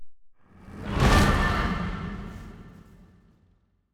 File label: aquatics dragon attack mouth